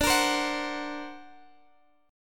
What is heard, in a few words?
D Augmented 7th, guitar